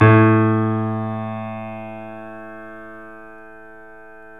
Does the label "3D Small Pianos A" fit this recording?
Yes